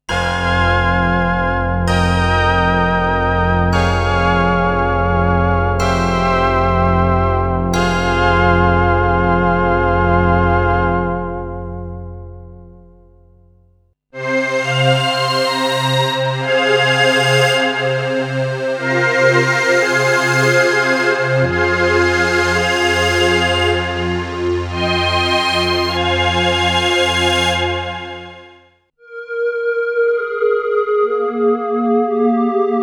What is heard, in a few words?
pads; Prophet-VS; pads hybrides; arturia; origin